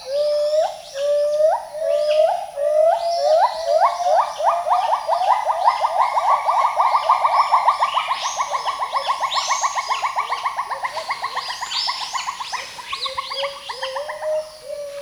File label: Gibbon not clipped